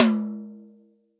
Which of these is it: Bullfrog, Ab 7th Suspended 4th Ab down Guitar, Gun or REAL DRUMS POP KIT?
REAL DRUMS POP KIT